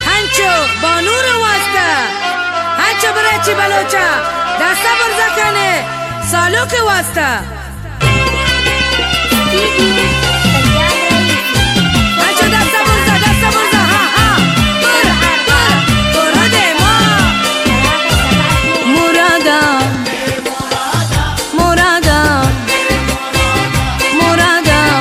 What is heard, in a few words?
balochi, ringtone